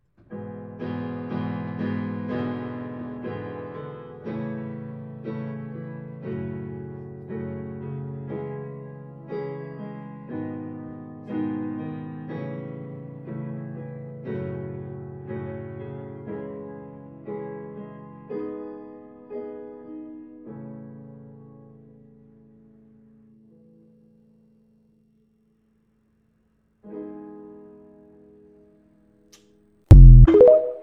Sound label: no vocals